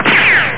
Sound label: ricochet!; charley cat mouster mind